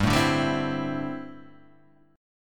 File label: D 9th Suspended 4th, chord